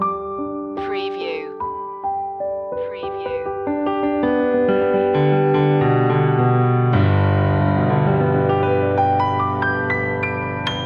piano; media music now

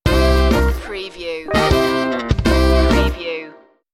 funk cake stinger

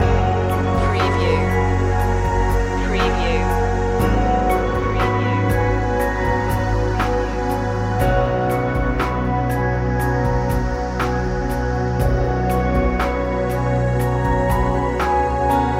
open universe loop

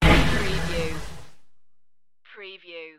starship door access

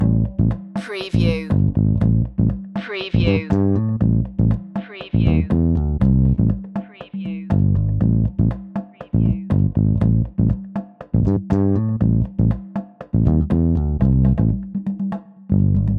funk soul king loop

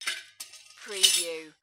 Falling Tools

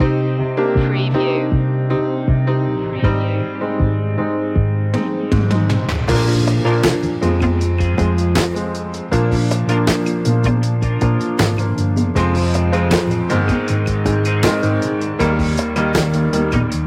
loop

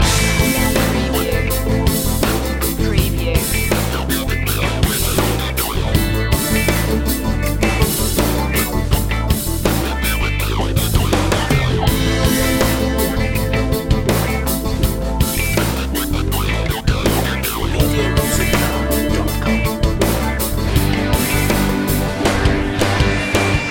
slam dunk loop

